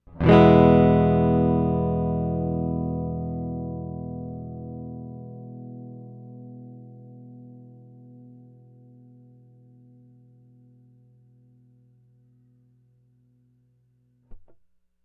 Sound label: Pattern without electronics, StockPickups